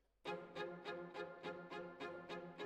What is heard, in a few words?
Violin Solo